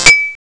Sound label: ding, Amiga Soundtracker; ST-XX Amiga Soundtracker Sample Packs